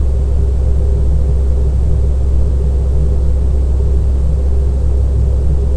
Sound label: heat duct, ambients; quake2